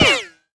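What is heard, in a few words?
bullet by, machinegun; weapons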